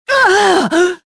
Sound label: gasp; tavion